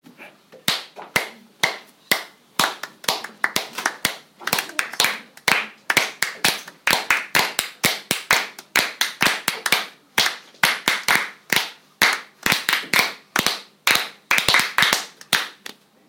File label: rhythm grid